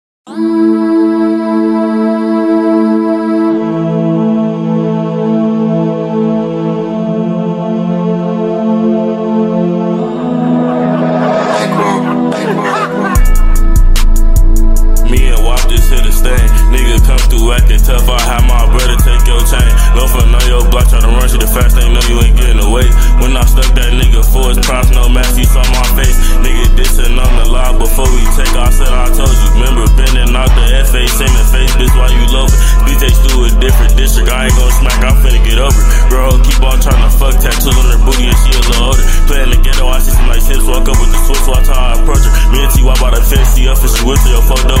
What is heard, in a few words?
rap